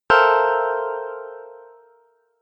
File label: Wrong, dong sound effects; multimedia